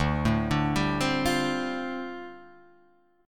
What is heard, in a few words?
D Minor 11th D down Guitar Drop D